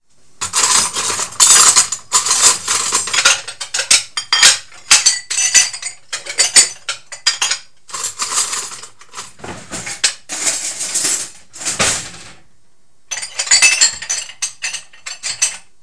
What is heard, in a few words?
DISHES